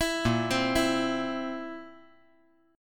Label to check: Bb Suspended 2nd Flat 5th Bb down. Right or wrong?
Right